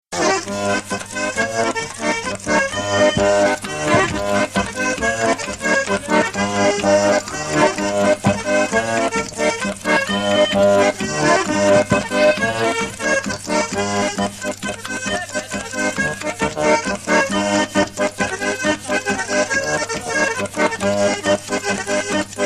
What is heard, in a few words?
Polka